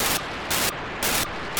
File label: 435 MHz 4k8 Mobitex beacon, satellite; Jaisat-1 435MHz7; On December 25th 2022 at 14:55 UTC Daniel DL7NDR received on 435.700 MHz the 4800bd MOBITE